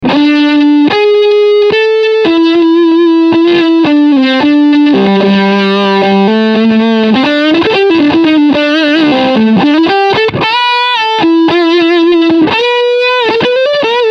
Distortion Full Gain

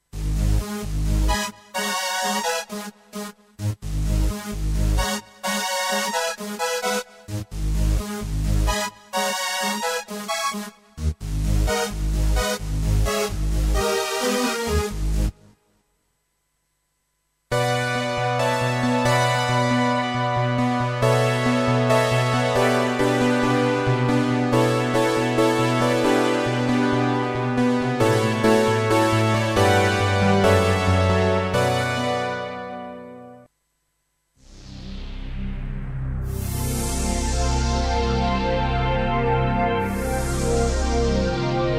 solo synthies

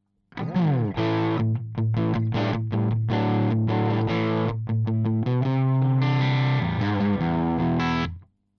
crunch Position 3 KSM313 front

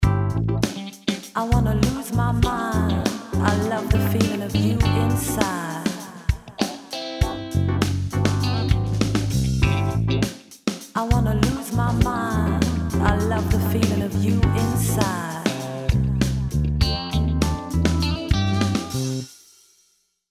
track mixed vocals; Presonus SL3

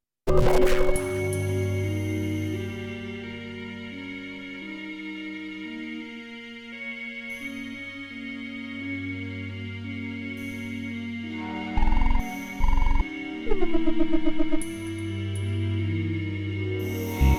Nord G2 Space Bazar

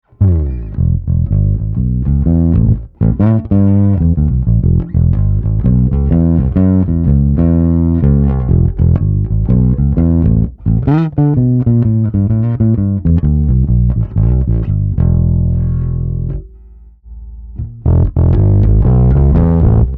Scrambler 8 active Bass

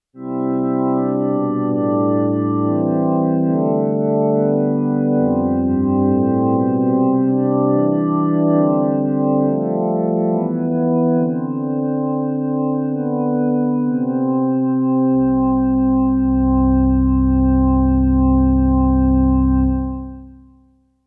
b4000 church

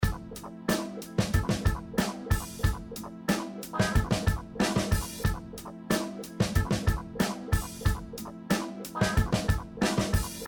4 Loops 3 Voice

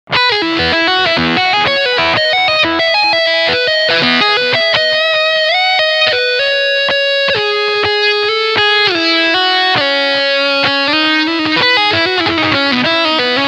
Leadsound Front PU

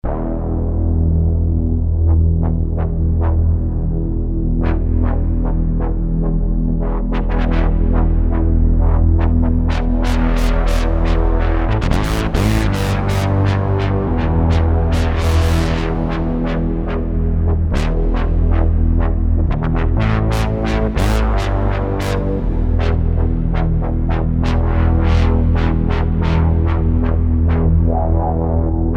Wobblebass

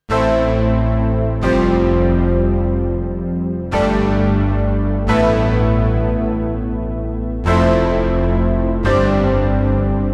Juno X vs MKS 20

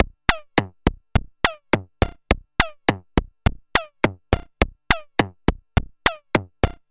Reon RE501 RX Driftbox Fast Percussion

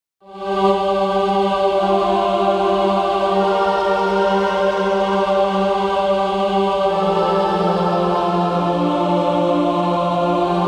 Male Female Choir

